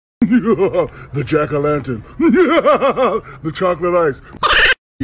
laugh; SHAQ; DONUT

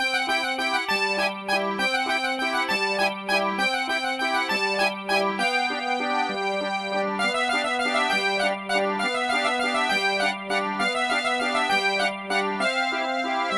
super mario 64 merry go round; Memes

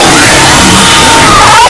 jumpscare sound; FNAF; bonnies return

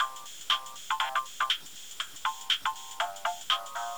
coolroom gta iv ringtone; Memes

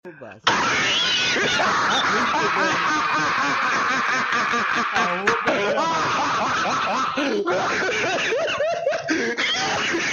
roblox laugh; Memes

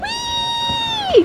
weeeeeeeeee

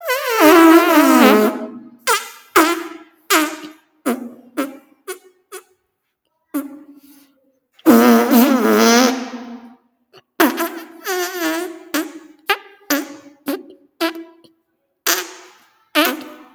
FARTS; memes

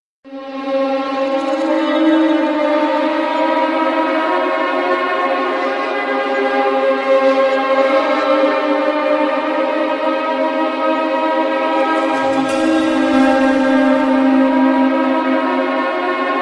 low health; gta iv

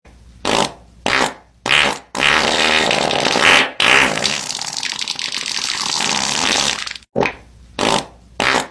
good fart; Long Fart

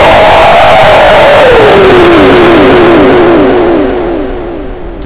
jumpscare sound; FNAF; btccw remastered

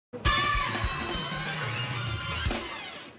weeeee; cab

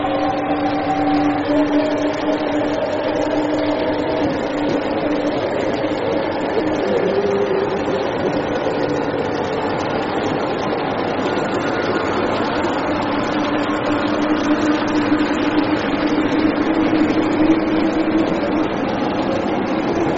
blitz attacking; Memes

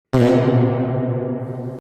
FART NOISE

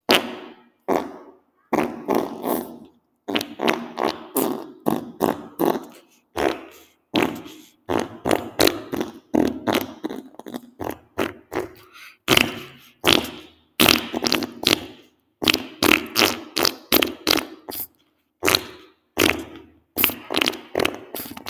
FARTS SONG

